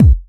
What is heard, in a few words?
Kick, Kickdrum; Equipped Music; Loungin House